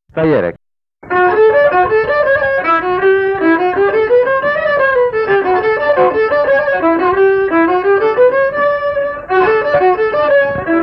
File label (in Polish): skrzypce sztajerek